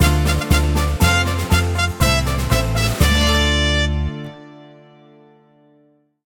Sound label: mission win; Western